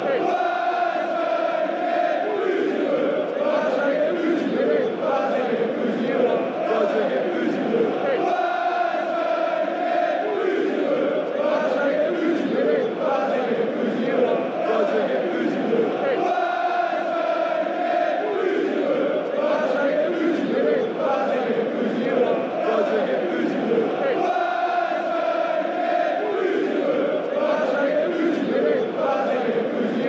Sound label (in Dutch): wij zijn geen fusieclub, fanchants